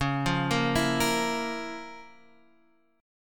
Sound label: F Major 7th Suspended 4th Sharp 5th C sharp, guitar chord